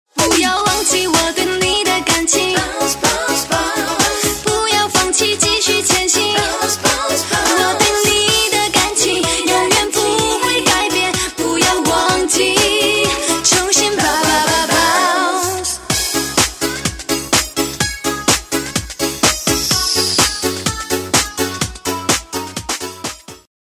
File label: Bounce, ringtones; Samsung GT-S8500 Wave